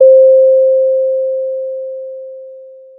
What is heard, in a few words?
Sound of a doorbell